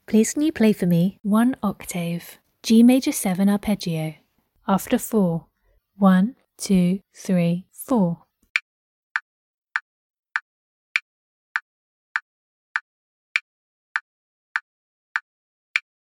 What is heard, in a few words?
g maj 7 arp